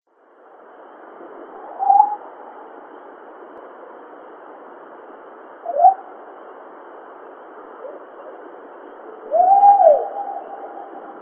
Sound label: Various Vocals